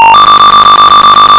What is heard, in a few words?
bonus; contactsamcruise